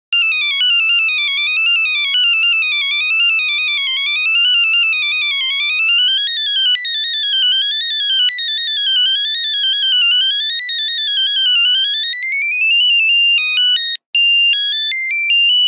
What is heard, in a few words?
Nokia Buzzer; Windows Phone apps; 6210 Bumblebee